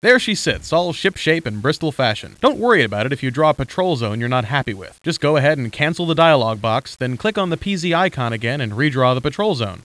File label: HARP